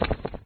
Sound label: flap; flappydick